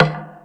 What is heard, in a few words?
Percussion, Drum Machines E-MU Pro-Cussion